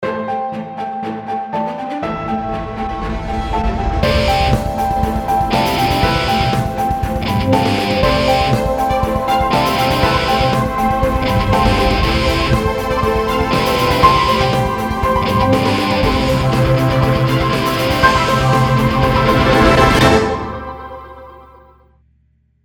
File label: Movie Intro; Foxtrott Hotel